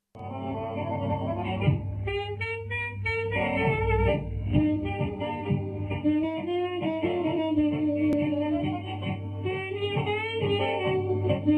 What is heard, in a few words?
Ukrainian tango